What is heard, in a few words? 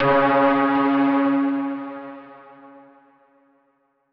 chime; chime sharp